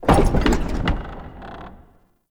Winch Stop; UnderCity

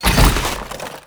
Collision Fast, Motorcycle; Warframe; Vehicles; Motorcycle Ninety Nine

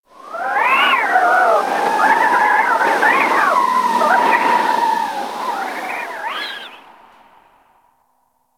Cold Wind Whistle, Ice; Warframe; Corpus Ice Planet

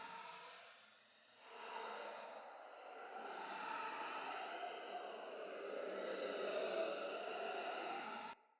Swirl Loop; Puzzles; Stone Spirit Creature

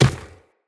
Infested Medium Run Dirt, Footsteps

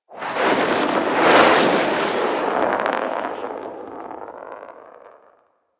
Arrow Barrage Whoosh; Midrath; Warsong Prologue

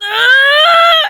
Laugh; Kuva Lich; Persona B; Ability Lift

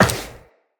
Run Metal, Footsteps; Tenno

